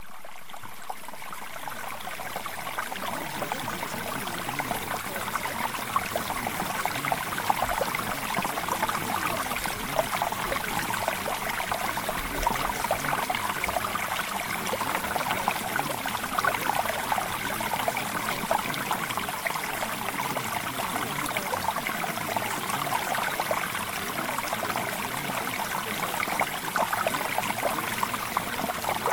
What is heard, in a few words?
Mountain Stream